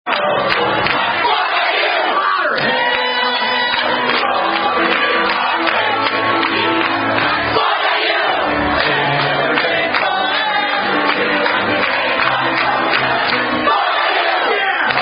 Fight Song; Fuck IU; Purdue

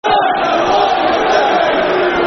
Football Chant, Soccer Songs; You Don't Know What Yer Doing; Southend United Sufc

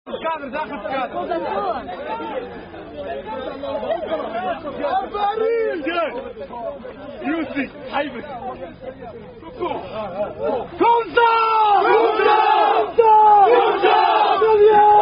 French Harka Chant; Ramo De Casablanca; Sing For Rugby

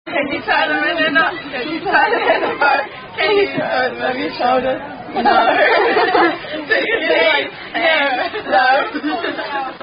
Do Your Knees Hang Low

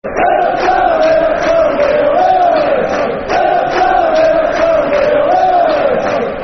Football Chant, Cheltenham Town; Soccer Songs; We Are The Town; Cheltenham Town Ctfc

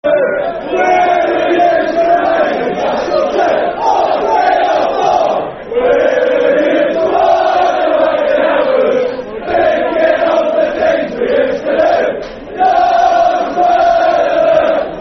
Football Chants; Wolves; wolverhampton wanderers; fanchants

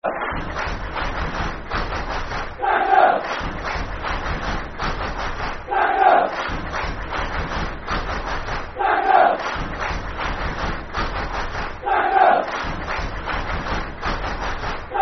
Dagenham (Clap) [Football Chant (Soccer Songs)]; Dagenham & Redbridge Drfc

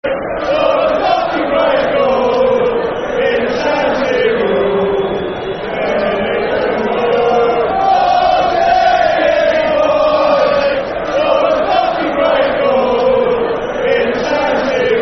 Football Chants (Soccer Song); Dennis Wise; Chelsea Cfc

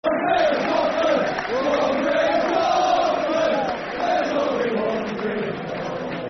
Football Chants; One Graham Coughlan; Sheffield Wednesday Swfc

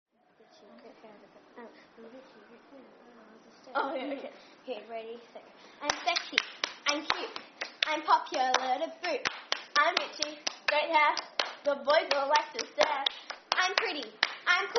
Clapping, Im Sexy Im Cute Im Popular To Boot

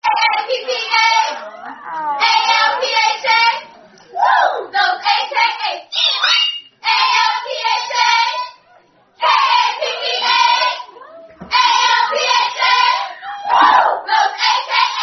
Alpha Kappa Alpha Sorority Chant; Spelling

